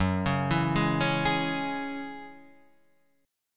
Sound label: F sharp 7th Suspended 2nd F sharp; F#7sus2 Chord {2 4 2 1 2 4} arpeggiated